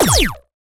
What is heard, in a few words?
Cwejman S1 - Hot Zap